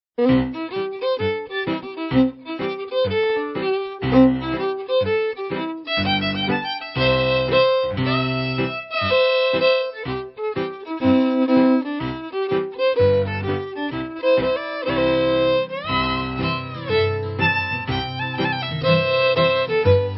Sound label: Bluebird Schottish